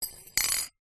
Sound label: fork metal movement in bowl; household; zapsplat